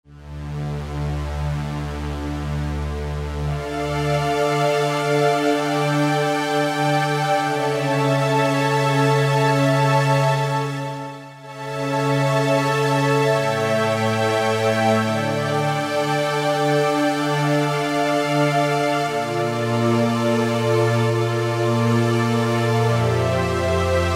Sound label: CS Strings, Roland SR-JV80-04 Vintage Synth